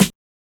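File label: HSE SD, house snare drum; X-Static Goldmine 1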